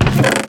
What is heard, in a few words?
chest open, minecraft